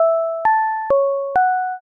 chime broadcast

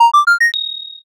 heal

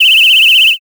whistle long

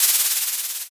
pen

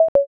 othello

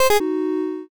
eliminate

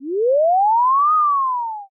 ghost appear

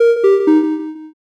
picosad

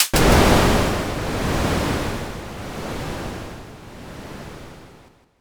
thunder

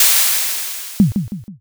airlock

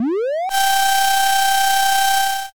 drill

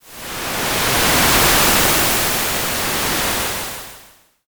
wave

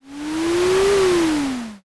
ship pass

